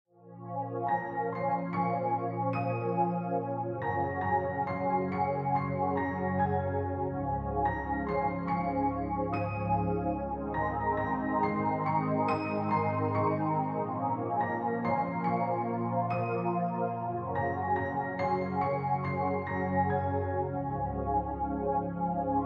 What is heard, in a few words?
Hope Instrumental